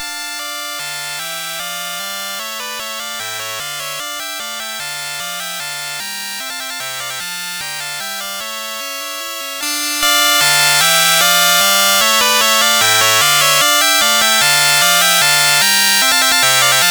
Menuet

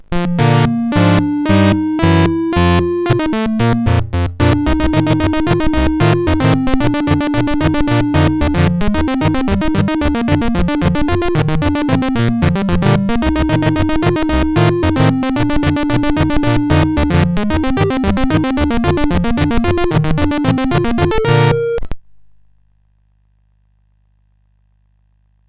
sid CSG8580R5; O Rileys Mine

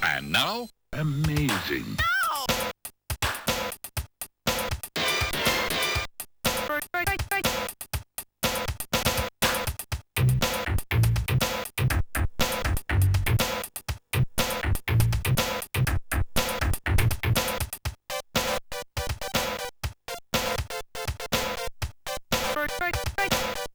hiphop

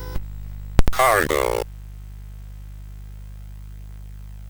sid CSG8580R5; Cave of the Word Wizard Set 4

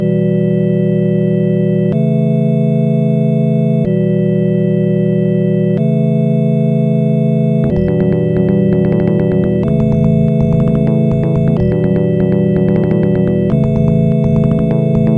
bass man; Instant Music

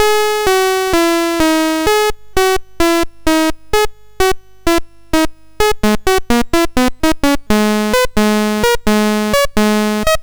MOS6581R4; GAMES

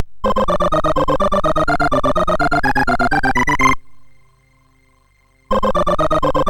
sid MOS6581R4; Omidar

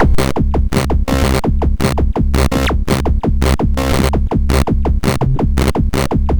sid MOS6581R4

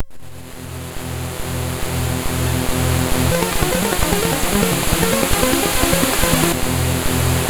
Short c64 tune, MOS6581R2

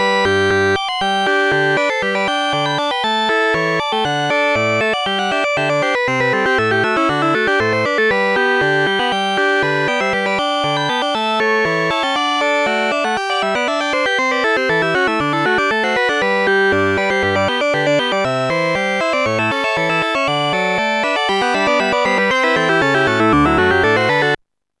sailor's hornpipe; Instant Music

